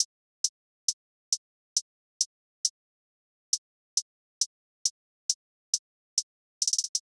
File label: Drum Loop 136 BPM Hihat; Loop Stems; Cymatics; Vibes II Drum Loop Collection; Zenith